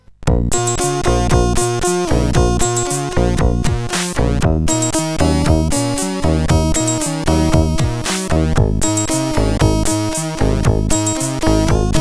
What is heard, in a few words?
CSG 8580R5; Black Hole vs Virus Magazine note